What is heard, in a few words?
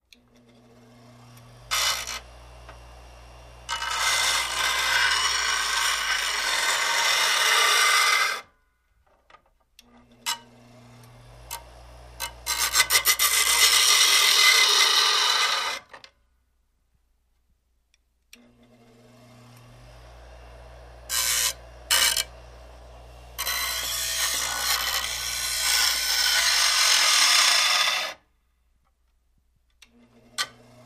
Elect Grinder Grindi, grinders